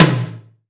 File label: TOM, DRUM KITS; InVision Interactive; 40 Oz Phat Slammin Hip Hop